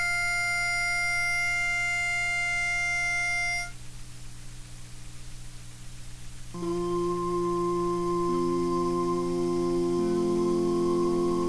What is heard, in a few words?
tenor mono